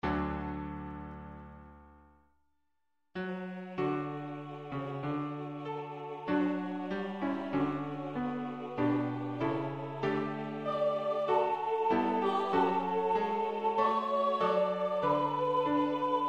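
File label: piano soprano; Faure; Requiem; Offertoire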